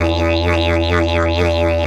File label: E 130, Didgeridoo